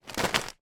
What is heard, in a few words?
present wrapped put down; foley